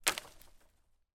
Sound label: impact tree branch small land on other branches on forest floor